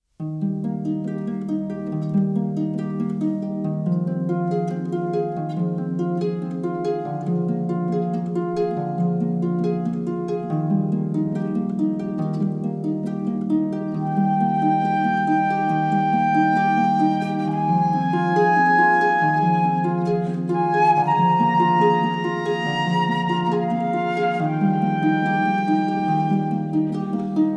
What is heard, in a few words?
Flute Harp; Ave Maria; Bach Gounod